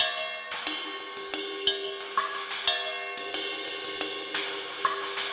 Cymbol Particle; Particles

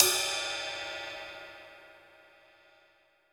Rock Ride, cymbals; Roland BK-5

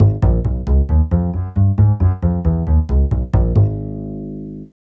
TV Suspense Bass-G#, 135; Zero-G Kocktail Kollection; Construction Kitz (Lounge); TV Suspense Mixes 135

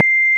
ORG Farfisa Flts; Flt 8'; Keyboards of The 60's and 70's

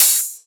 Open HH, Cymbals

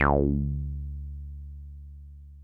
303 C2, TB 303

